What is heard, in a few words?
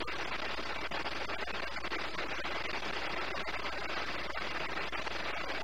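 EDACS Wideband Control, radio sounds; Harris EDACS Wideband Control Channel; This is the most common form of control channel used in EDACS systems. This is a 9600 baud data stream.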